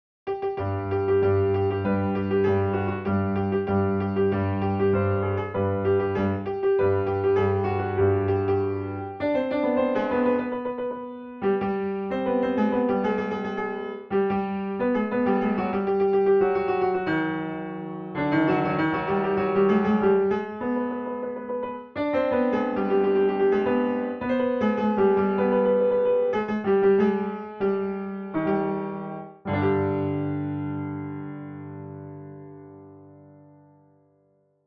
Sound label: Naghmeh Zang e Shotor Chahargah, Radif; Radif Mahjoubi